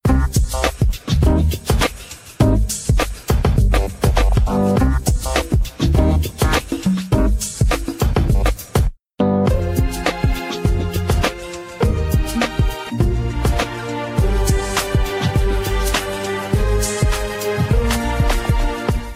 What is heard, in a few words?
iphone sms ringtone; gossip girl